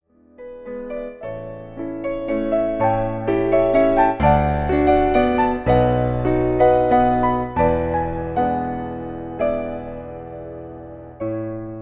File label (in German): Walzer; Brahms